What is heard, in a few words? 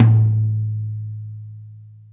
real TOM, REAL LIVE KITS; A Real Freakin Drum Kit